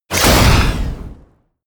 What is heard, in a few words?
artillery missile truck fire